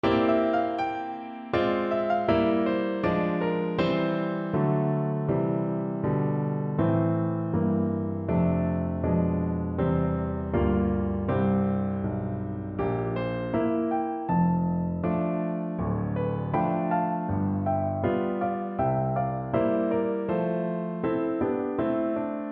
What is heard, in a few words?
horn, French Horn